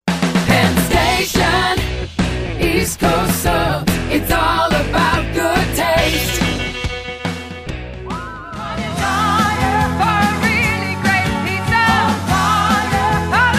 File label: jingles